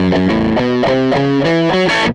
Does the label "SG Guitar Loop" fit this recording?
Yes